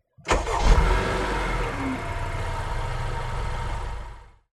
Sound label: car